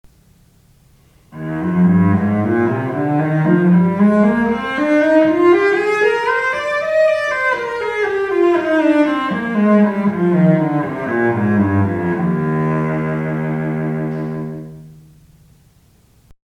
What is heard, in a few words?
cello Eflat major